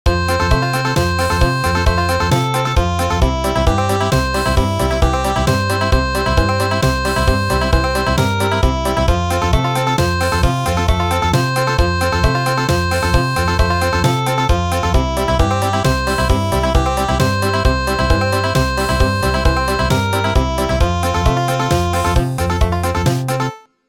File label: Country